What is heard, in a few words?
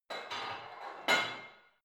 barbell heavy plate put on reverby hard, fitnessstudio